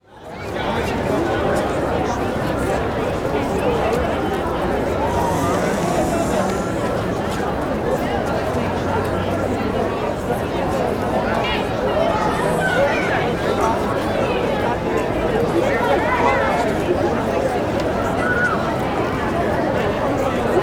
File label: Crowd Outdoor Busy Campus, Walla; Sound Ideas; Walla Series